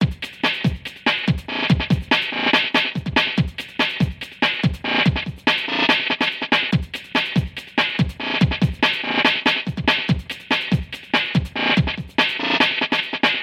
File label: breaks